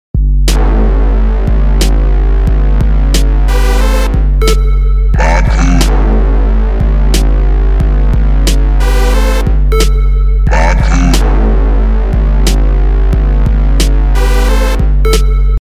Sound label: Drum and bass